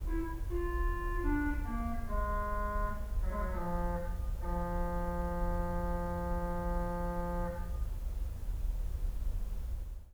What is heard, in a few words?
clarinet